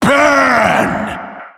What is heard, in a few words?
staff magic